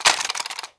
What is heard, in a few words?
box in, negev; csgo